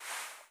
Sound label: Moss Knight Fat Jump